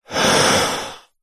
human breath in relaxed inhale; zapsplat

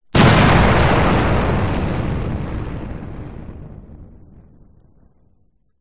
explosion large gas

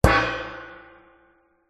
impact metal lid trash gong like; zapsplat

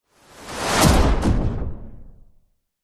sound design cinematic whoosh into punchy thuds; zapsplat

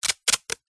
office parcel tape peel from roll

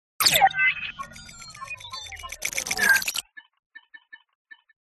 Open; PMSFX

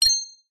multimedia game tone metal chink collect action

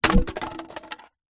foley insulation tile drop; zapsplat

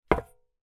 industrial paint pot metal down on surface gently

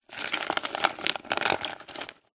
coffee pods in plastic tub rummage movements; nespresso

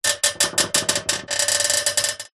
foley metal creak wobbly frame; zapsplat

